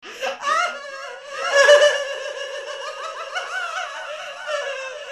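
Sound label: Rhythmic Dub Laugh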